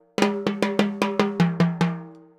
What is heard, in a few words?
Timbali, Latin Percussion